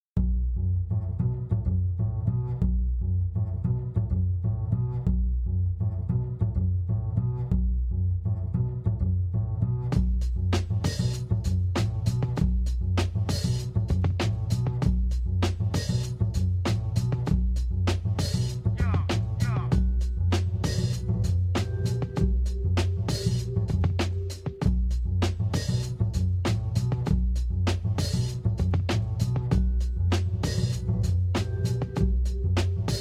Bass Groove; ninja pizza girl; linux macos ps4 wii u windows xbox one gamerip 2015

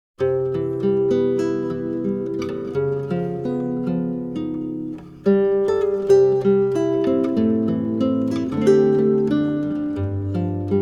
GUITAR SOLO; Aria the Natural; AQUA

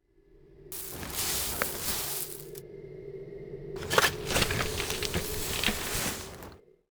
Water Axe Floor

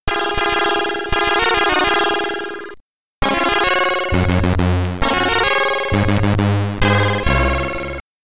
SID Stereo; Kings of the Beach; Commodore 64

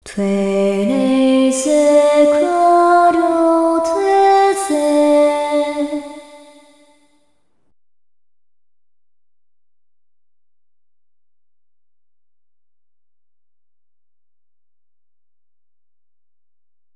Hymn; tales of the abyss 2005 ps2 gamerip; Fonic Hymn

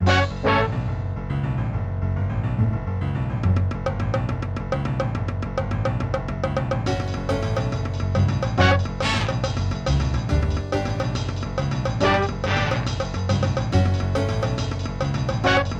Jazz; nds

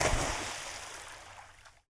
Splash

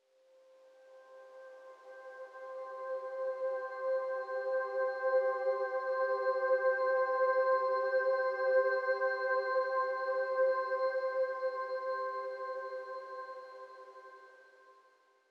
Crystal SOS tone Klang; inside

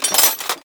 Mechanics Metal Mechanism Mono; Afterbern Democralypse; Windows

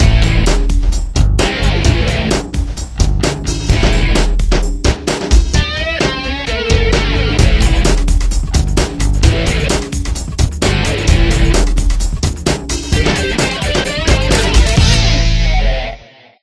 Cheerleaders Dance Theme; kurt warners arena football unleashed ps1 gamerip 2000

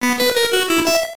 INSERT COIN; Sagaia; GB

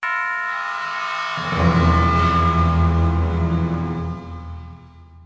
Failure; bolt 2008 pc gamerip

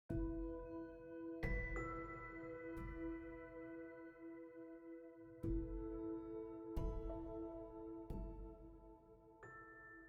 Cave Interior

